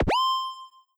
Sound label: exclamation mark low